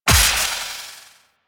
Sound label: Serpent Ability Tendril Crack; Warframe; Warframes; Alchemist